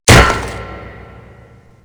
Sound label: granny melee hit sound; zerosiki; slender